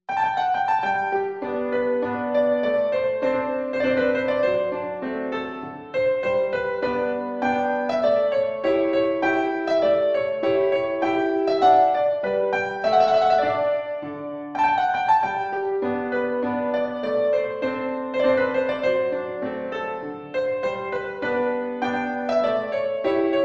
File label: Minuetto from String Quintet